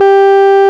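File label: RMI piano G 3, EP; Sonic Reality - Vintage Timetraveler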